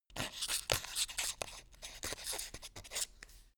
quill write

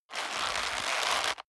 leather creak short hard

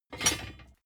plate armor pick up

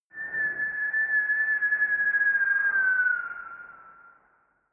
air strike

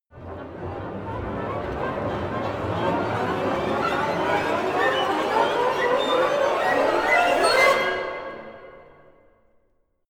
orchestra chaos

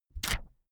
notepad sheet tear off fast

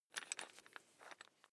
electric drill bit fasten wrench turn fast

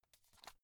watch case small open quick

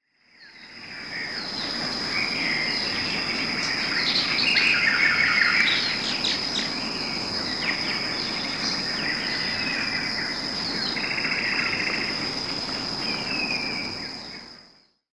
village birds

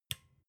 desk lamp on soft

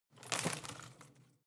home plant leaves rustle regular short

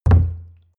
canister full put down

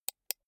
computer mouse right click soft

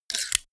stapler

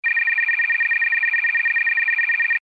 title running layer signal

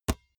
basketball single hand catch hard

